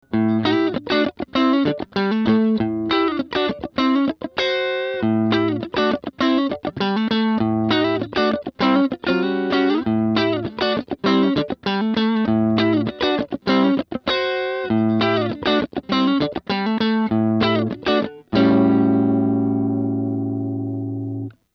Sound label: tele neck